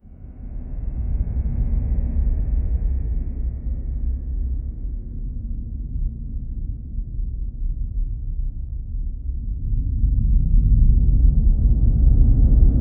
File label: city ambient hum gradual, earthquake